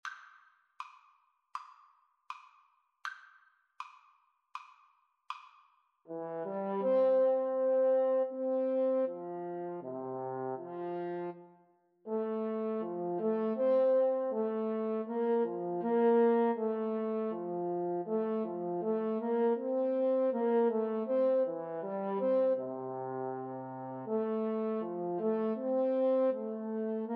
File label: clarinet horn, Clarinet-French Horn Duet